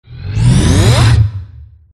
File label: security bot power on; gow